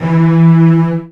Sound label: Virtuoso Strings, TRM FX